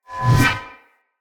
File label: Shield Whoosh Heavy; Weapons; Enemy; Shields; Sinecure Shield